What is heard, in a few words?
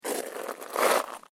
SNOW CREAK; Material components for constructing more complex sounds; Snow (Sound Effects:Weather)